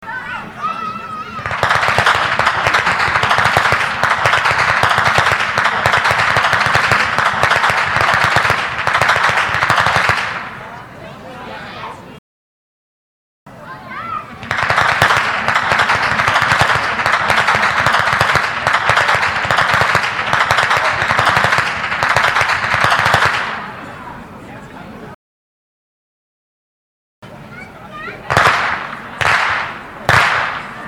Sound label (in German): Schnalzen; Feldkirchen; Ainringer Schnalzen